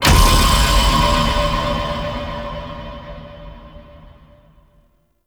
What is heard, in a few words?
Button Final Activate Stinger; Snt Medusa; Warframe; The New War; Sentient Devourer